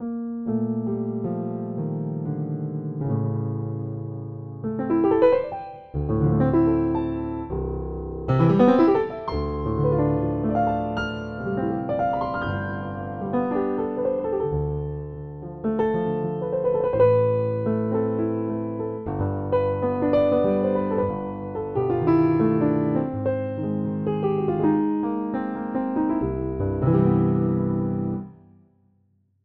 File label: Trident improv moment